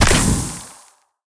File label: crit; smite; olorun